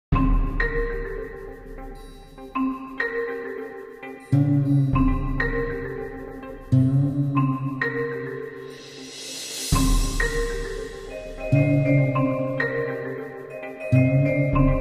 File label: Forest Theme; Rough Draft